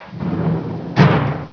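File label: DOOR CLOSE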